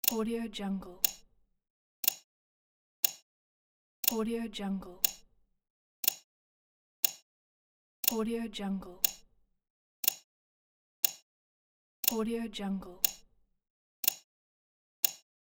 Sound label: old clock; bogdanbogdanov